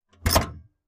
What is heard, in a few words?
Ford LTD hood and trunk open and close. Vehicles, Sedan Sedan, Car Open, Close